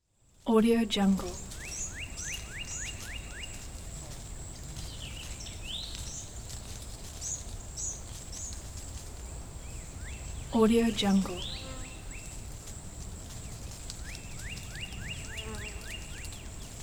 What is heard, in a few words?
forest ambience